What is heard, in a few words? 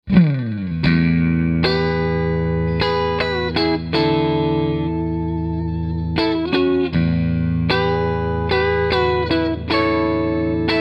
comp normal